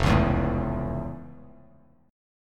F Augmented Major 7th F; F+M7 strummed; 1,4,2,2; JGuitar

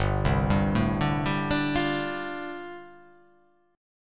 G 9th Suspended 4th

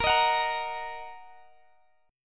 A sharp Suspended 4th Sharp 5th Guitar Drop D

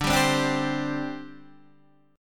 D 7th Suspended 4th, down

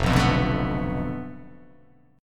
F 6th Flat 5th F down, chord

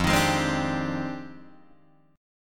F Minor 13th, Guitar Drop D

